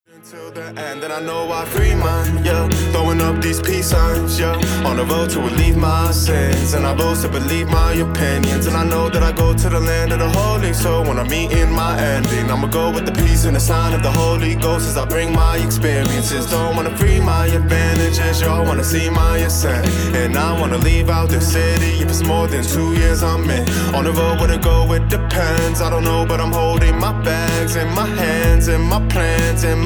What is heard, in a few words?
POP HIP HOP